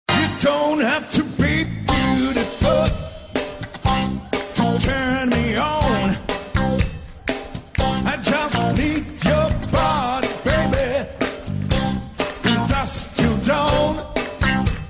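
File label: Male Slovak sing mix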